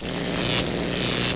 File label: elect; X-men